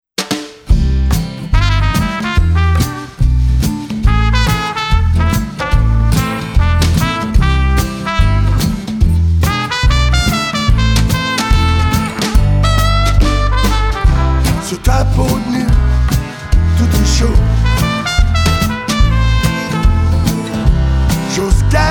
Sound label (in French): Question de blues